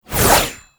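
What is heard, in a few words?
Teleport Disappear; Enemies; Abilities; Warframe; Tau; Dax Ninja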